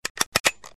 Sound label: stuck, toy gun; twitch channel redemptions